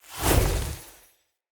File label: Staff Caster Cast Heavy; Weapons; Player; Staves; Starter